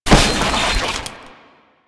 Military Backpack Destroy; Objects; Warframe; Storage Container

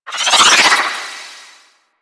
Arch Wing Prime Dodge Roll Right; Movement; Warframe; Vehicles

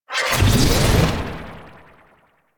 Mod Cycle Complete; DE; Warframe; Riven Mod

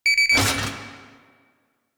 Railjack Weapon Power Ready Three; Modular Weapons

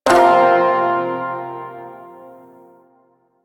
Shawzin Pent Min Chord; Music; Chords

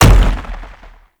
Biped Step, Enemies; Warframe; Man In The Wall

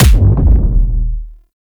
Infested Shotgun Punch; Weapons

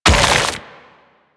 Stone Gaze Freeze Finish; Warframe; Brawler

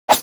Swing, Weapons; Dual Zoren Prime

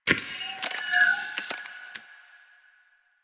Cave Hi Tech Clicks; Ambience; Warframe; Stalker Cave

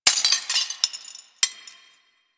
desktop glass destroy; ambience; Warframe; Entrati

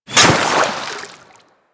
Kunai Impact Water; Warframe; Hit Effects

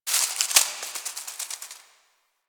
Foliage Rustle; Warframe; Quests; TheNewWar; Ambience; Zariman

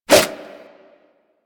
Nunchuck Whoosh A, Infested, Weapons; Warframe

